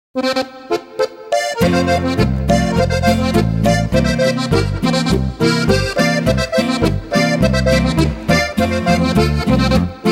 Boarischer

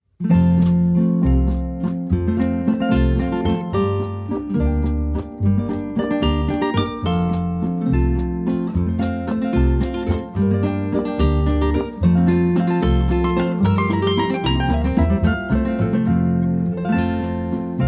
Landler

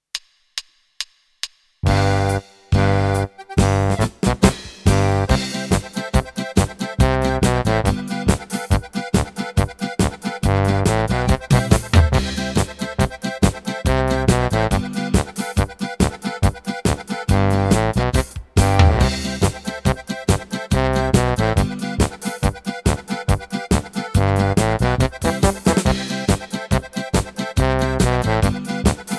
Polka ohne Melodie

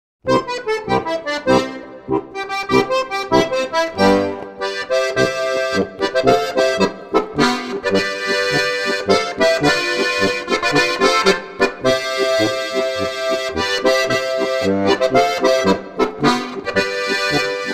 Marsch

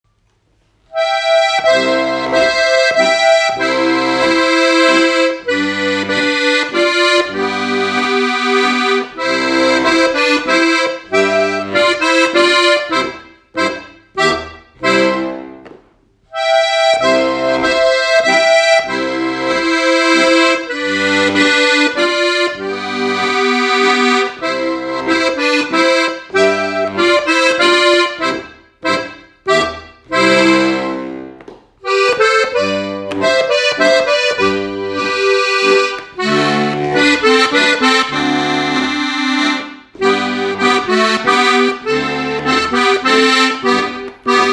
Menuett

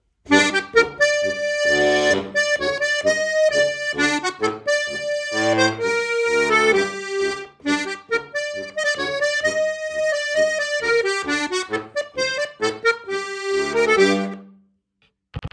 Zigeunermarsch solo

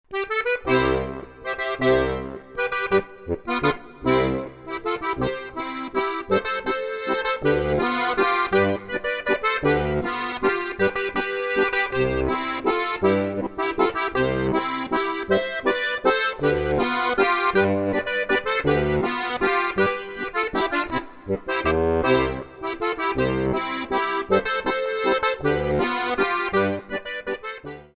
Plattler

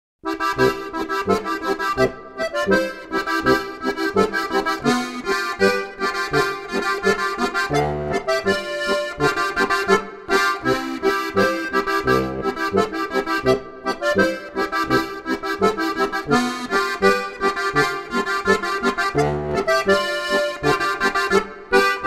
boarischer

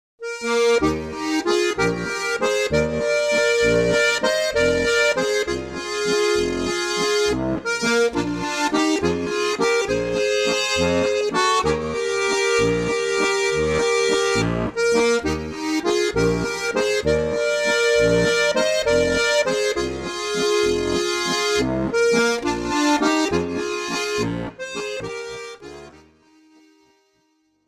Harmonika

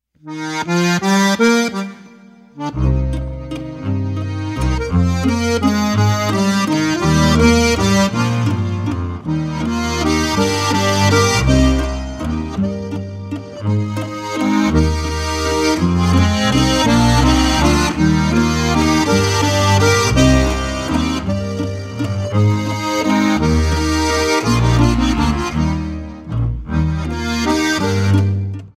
Walzer

